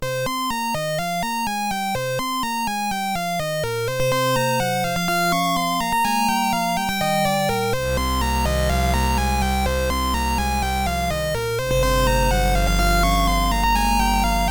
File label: med synth; OctaMED MED